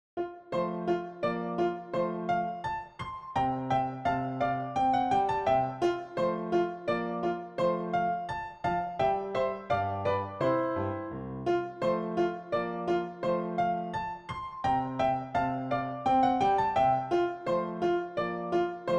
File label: Dance PianoTeq Bechstein; Dittersdorf 20 English Dances Schott